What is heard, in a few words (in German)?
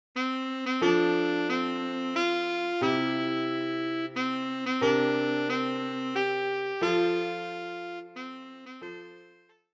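Altsaxophon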